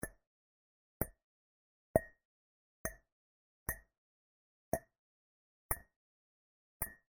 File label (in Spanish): Tintineo; Banco de Sonidos - Facultad de Art; Lazzeri - Gallichio - Alperte - Calvo